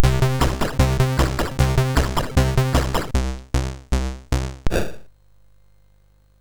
Fire Breath, MOS6581R3

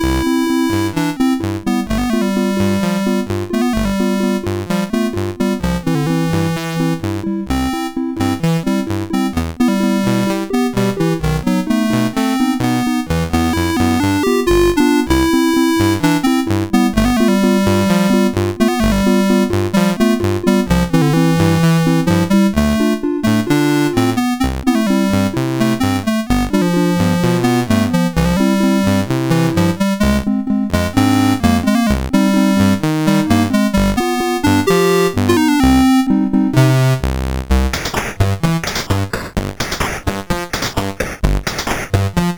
Tango, CSG8580R5

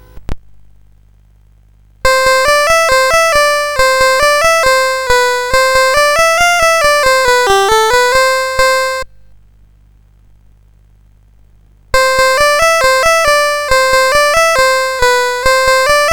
Yankee Doodle, CSG8580

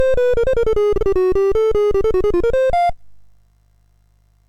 MOS6581R2, GAMES; Sammy Lightfoot

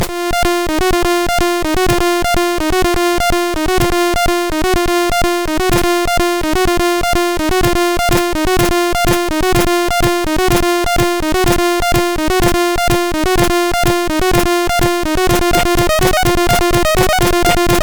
Techno, CSG8580R5

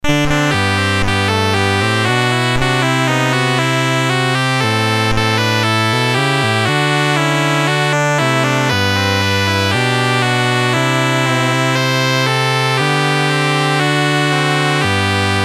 CSG8580R5, GAMES; Who Killed Cock Robi BASIC; HVSC

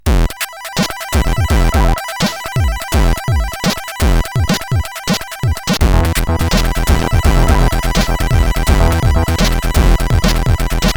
Screwed sid CSG8580R5; hvsc

